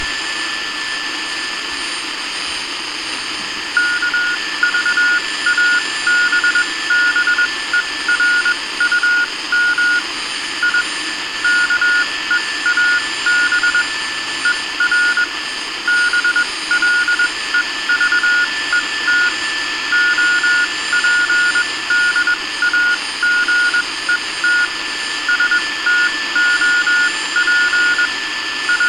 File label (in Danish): morselyd